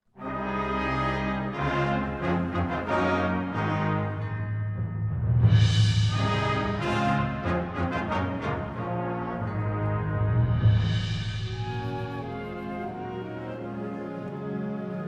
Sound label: Wind Symphony; The Ocean Springs High School Wind Symphony